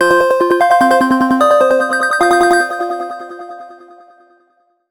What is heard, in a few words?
Sunflower, ringtones